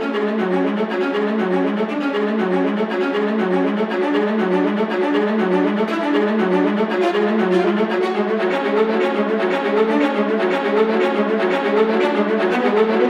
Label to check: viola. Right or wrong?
Right